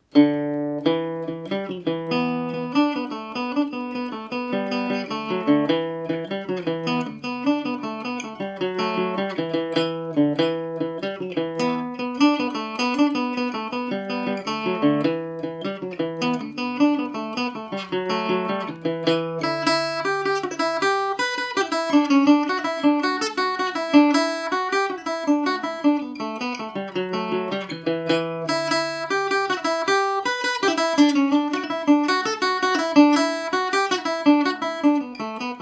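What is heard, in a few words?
E dorian, Irish mandolin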